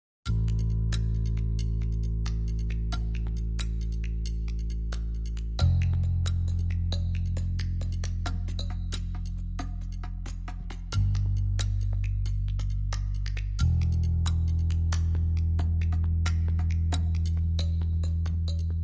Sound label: Drums and Bass